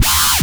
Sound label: bass